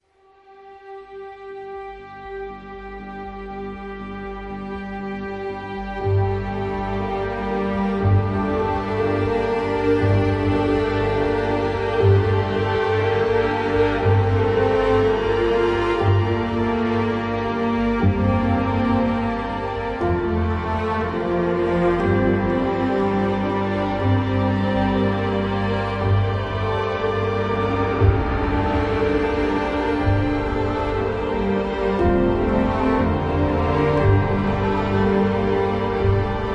Prayer Ambient

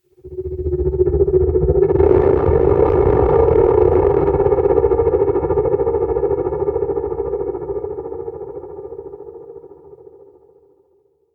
Alien Grown Scream; Ninja Tracks

